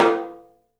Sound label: Studio Toms; 3D Percussives